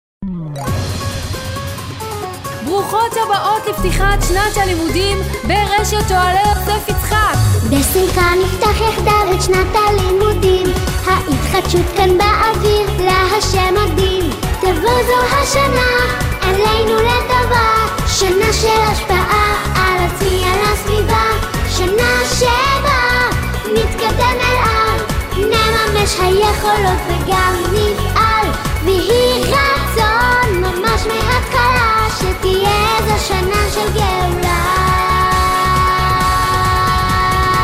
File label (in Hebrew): גינגל פתיחת שנה בנות